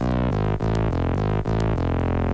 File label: burpy, Loops Electronic